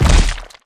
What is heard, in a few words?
hit; DayZ-Epoch; dayz sfx